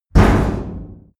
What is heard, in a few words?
big metal impact short